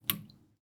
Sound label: kitchen sink drips metal sink drops leak faucet